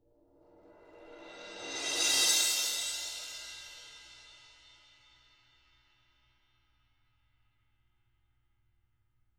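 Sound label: CRASH 18 SWELL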